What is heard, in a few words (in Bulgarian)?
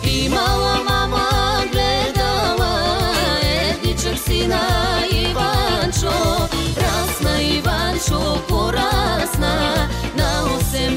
folklor